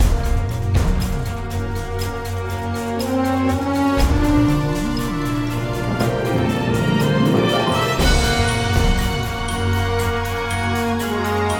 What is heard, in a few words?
Intro Epic; Polyederpodcast